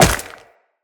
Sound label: Wall Jump Ice; Tenno; Footsteps; Wall Run